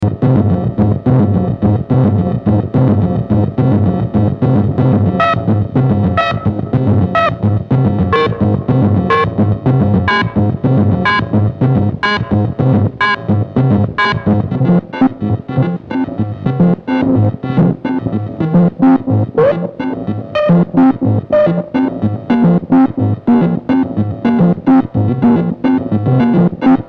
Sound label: Buchla Box Music rotation; Warners